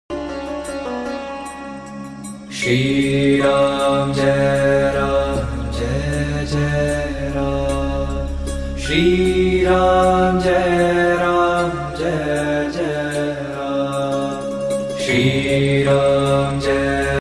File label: Ram Taraka Mantra